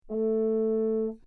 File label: french horn; orchestration